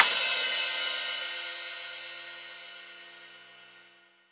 drum Loop 140BPM Crash

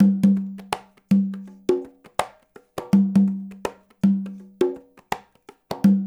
LOOP, CONGAS, Percussion; InVision Interactive; Percussion Slam

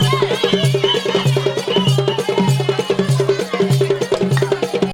KANKURAN DANCE; Best Service; World Colours

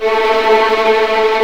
A3 TREM, VLNS TREMOLO, String; East West; The Ultimate String Collection

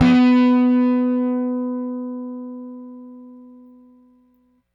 Rickenbacker Bass; Airwindows

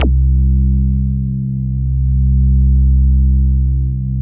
MINIMOOG

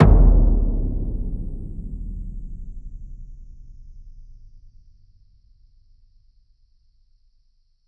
Percussive Stab

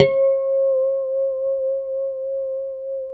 D5 pick harmonics, guitars; Spectrasonics; Hans Zimmer Guitars VOL-1; JC-HARMONICS

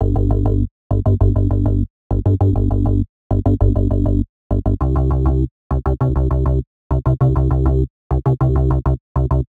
Gmaj Bass Mono Tap, 100; Zero-G; Future Wave

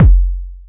Boom, Yamaha FS1R

